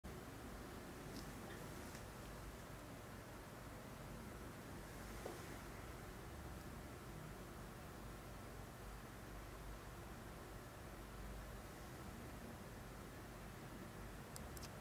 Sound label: snow fall sounds